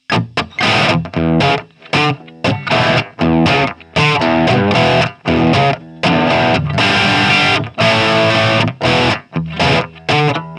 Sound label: Humbucker Bridge Active Bridge Crunch